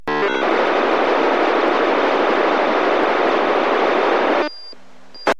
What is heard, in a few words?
EDACS ProVoice; Harris ProVoice; This is the current form of digital voice originally developed by Ericsson.